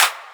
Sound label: Clap